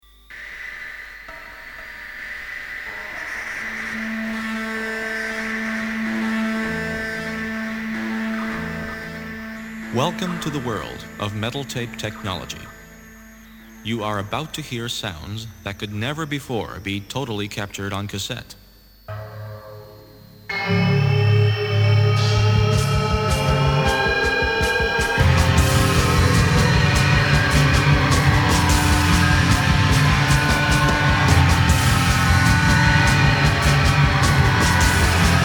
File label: slot car synth